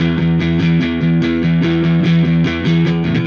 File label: Silos Dirty Strum Guitar, Indie Rock